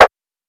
Perc; Hark; Cymatics; PHARAOH Beta Pack; Percussion

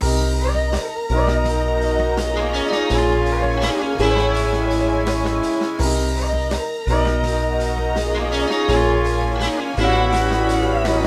83 BPM D# Min; Cymatics; Zodiac Vol 2 Beta Pack; Zodiac Vol 2 Premium Compositions

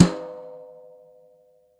Maple Brush, snare; Drums; Ghosthack - Free Snares 2023

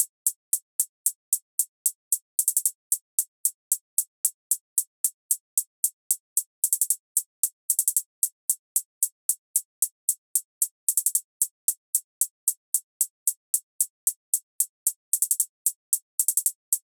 Drum Loop - 113 BPM Hihat; Loop Stems; Cymatics; Bermuda Pt 2 Drum Loop

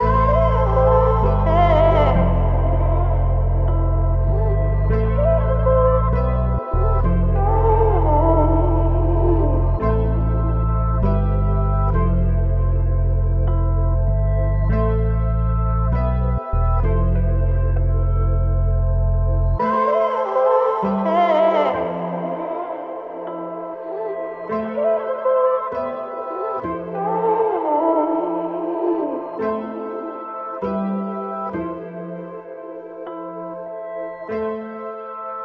98 BPM A Min, Melody Loops; Blue Eyes; Cymatics; Orchid Sample Pack

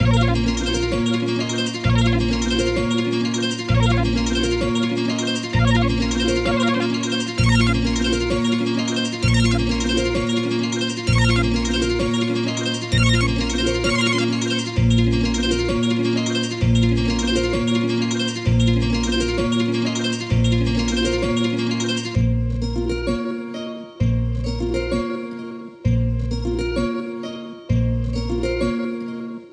130 BPM A# Min, Melody, Trap; Cymatics; 2022 Melody Collection; Trap Pt 2

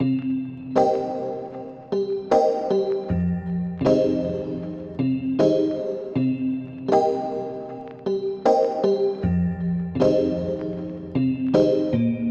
Lofi Melody Loop - 84 BPM C Min; Cymatics; Oracle Sample Pack

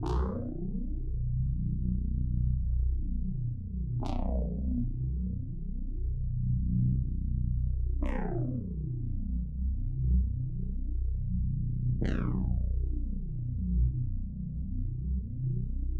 120 BPM B Min Synth Bass; Cymatics; 2022 Melody Collection; Phantom

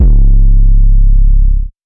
808 - C#; 808s; Cymatics; Cobra Hip Hop Sample Pack

